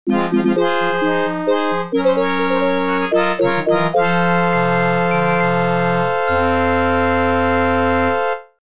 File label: GameOver; Tiger